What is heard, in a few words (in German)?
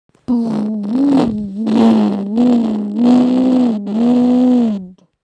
brumm, lamborgini; lambogine